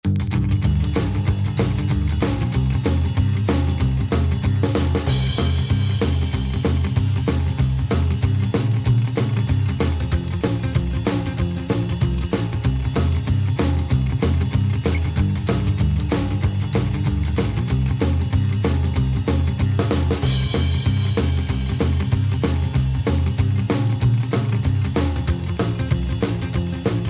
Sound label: fast swing bpm 190 key c